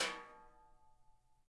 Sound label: Snr Brush; Digital Sound Factory